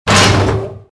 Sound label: walk; Star Wars Jedi Knight II Jedi Outcast; XBOX; mark1